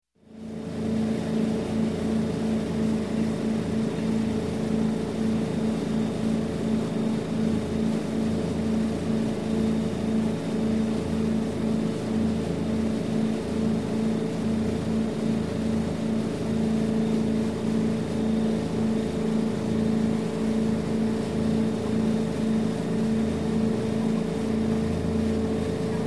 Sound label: Boat Inboard - Cruising Along; Chris Craft; Marine; Sound Ideas; Series 2000